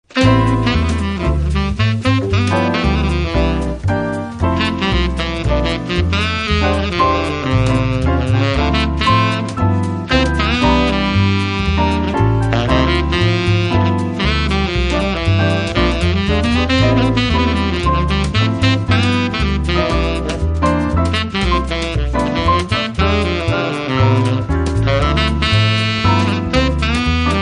Quartet

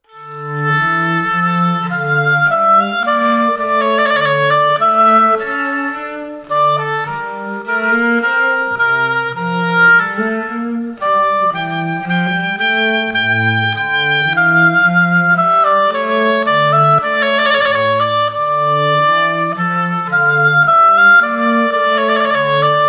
Classical Trio; Andante; Water Music

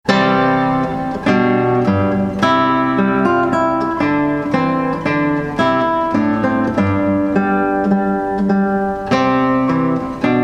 Trumpet Voluntary Wedding, Classical Guitar

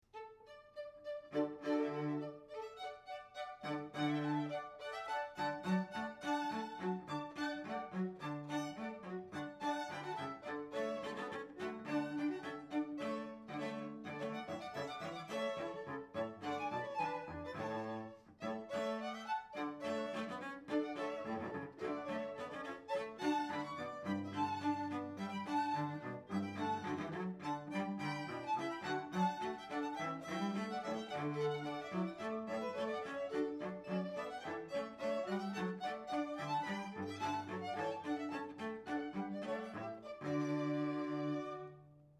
string quartet

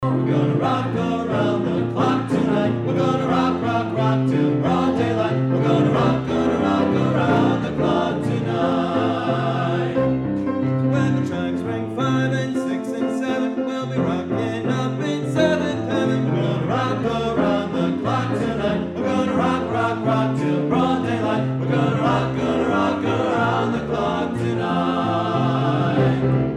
50s hits trio

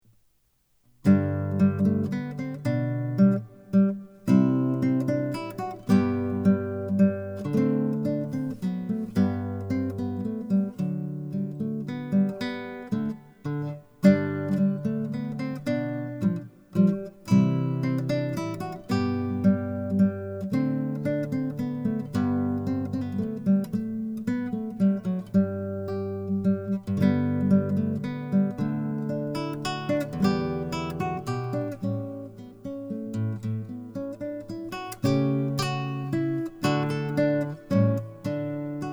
Minuet in G solo, guitar; original arrangement; Donnie Walker

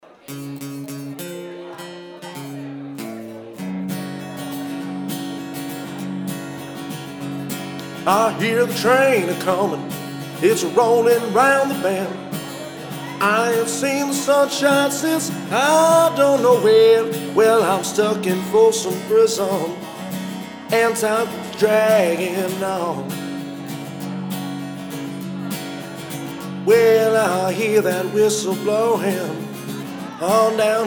Live, acoustic guitar

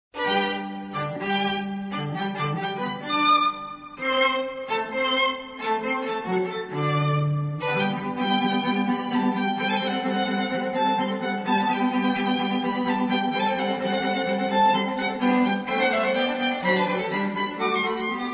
String Trio

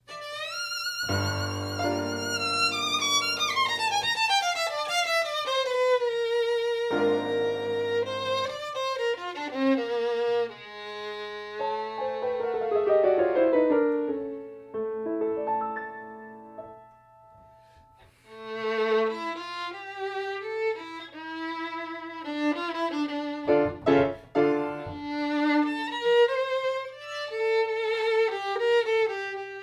classical trio

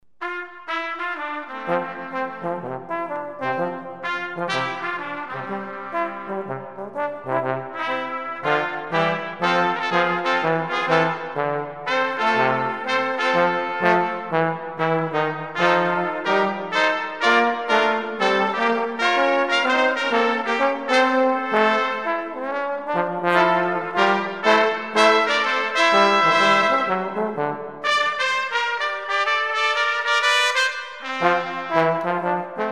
brass quartet

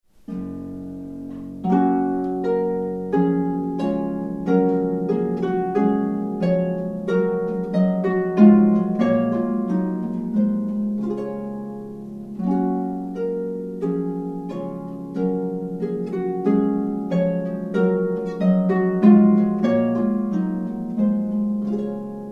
Air, harp; Handel

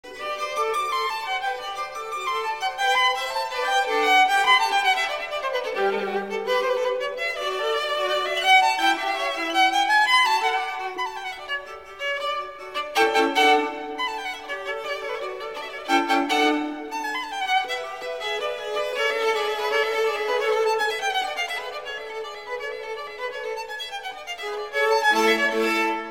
String Quartet; Gabriella Strings

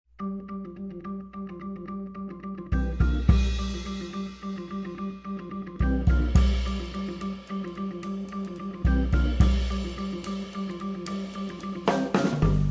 Blues Band; Great Swamp Blues Band

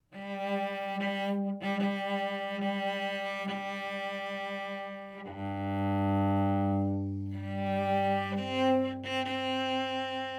cello; Bridal Chorus from Lohengrin

